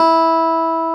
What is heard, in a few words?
CLAV E4, CLAV PIANET; Sonic Reality; Vintage Timetraveler